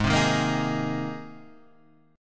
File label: G sharp Major Add 9th G sharp; G#add9 Chord {4 3 1 3 4 4} strummed